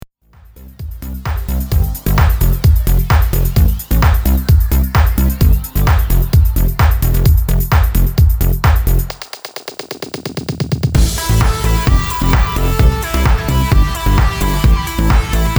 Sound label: 130bpm remix